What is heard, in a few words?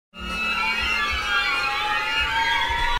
Sting